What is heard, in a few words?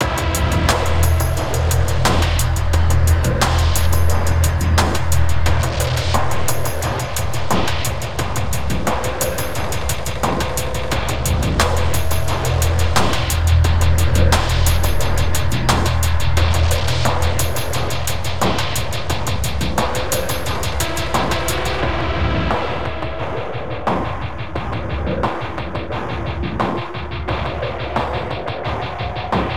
Industrial Intensity; net-gunner